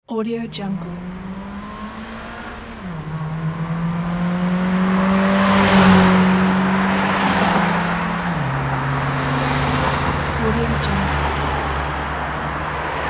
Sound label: highway